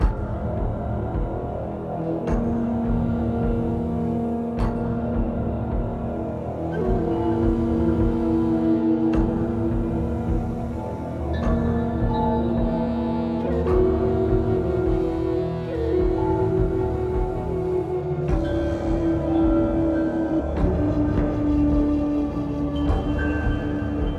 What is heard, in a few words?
music loop; Rabcat; north Storm